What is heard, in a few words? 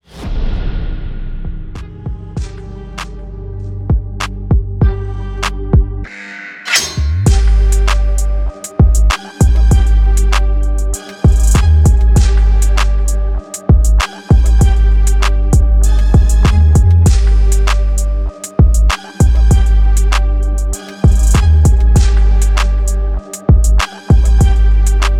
BEAT